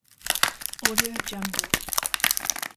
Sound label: ice cracking